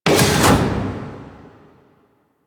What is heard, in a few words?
Galleon Large Door Open; Grineer Galleon